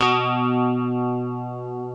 B1 CLEAN RIK

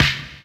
SD, Dance Mega Drums; Best Service; SD-HIP

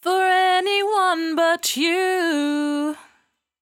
68bpm D, Velvet Vocal; Zero-G

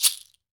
TANZANIAN, African Perc

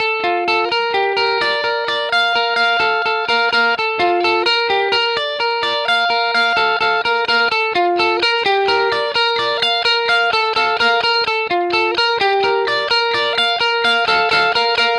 lead F Bpm128, 70s Guitars; Fox Samples; Back to the 70s Guitars

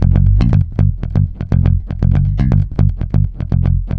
G, groover, bass; Spectrasonics; Bass Legends

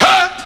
tuners

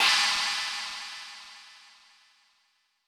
Roland U220 Dry Set

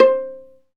STR VLA PI, Chamber Pizz; Roland L-CD702

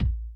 kick, Millenium MPS-400

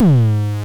FM Kicks Distortion G#2; Drums; LookIMadeAThing Kicks - Vermona Kick Lancet

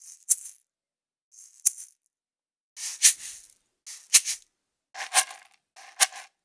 Raw Perc Shakers

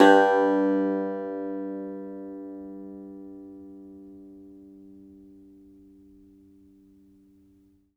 cumbus saz G, plucked strings; E-MU Producer Series Vol. 7 – Old World Instruments

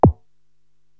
Zap, Korg TR-Rack drum machines

